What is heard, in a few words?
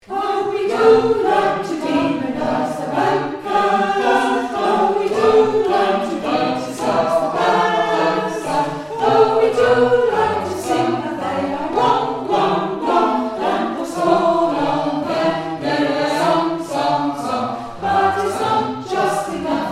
bankers song all